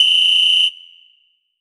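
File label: WHISTLE, drum machine; Drum Mashines; EdgeSounds; JD DRUMS KIT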